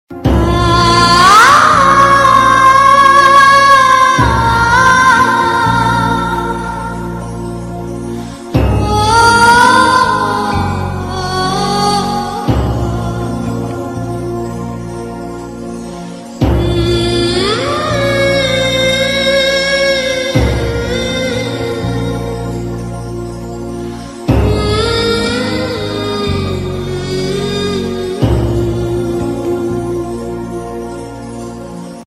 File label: Background Music; Kahiin To Hoga; Kashish